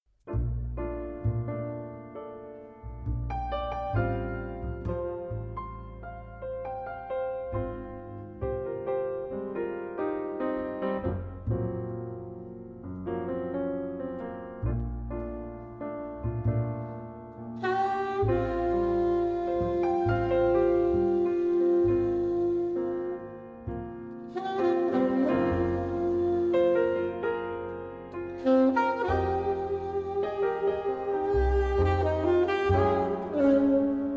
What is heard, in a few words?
bossa nova